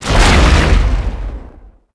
explo droid; impacts explos

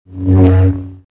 saber hup; weapons

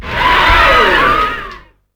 VOICE OH; UFCTapout1; XBOX

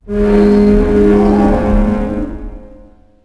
radio mast creak short; Max Payne; penthouse

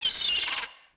seagull; Ambient; MX vs ATV Unleashed

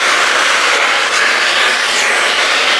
Edge Foley; XBOX; Amped 2

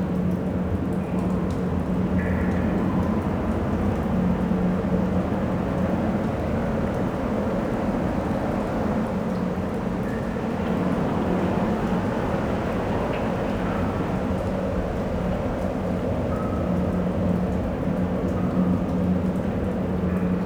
cave large; Star Wars Knights Of The Old Republic I; XBOX; stream music

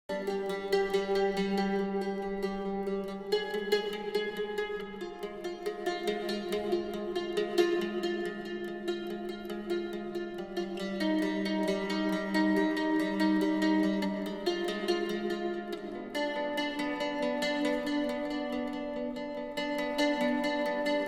mandolin; Myst III Exile

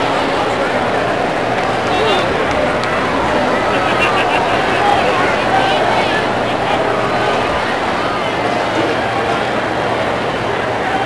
Idle, Crowds; XBOX; AND1 Streetball

